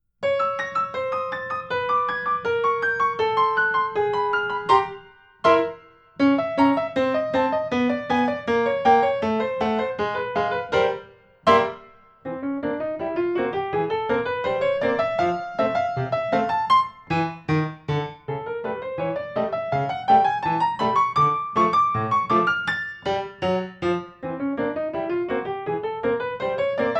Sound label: Chromatic Rag